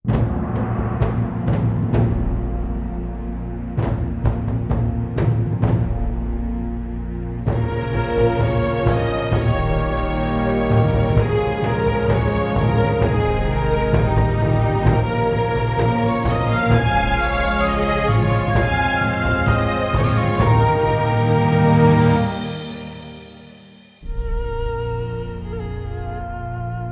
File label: Far East Victory